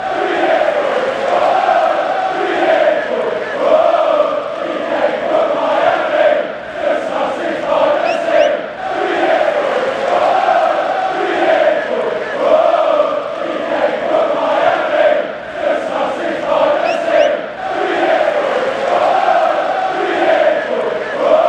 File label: chant, fan chants; diego gomez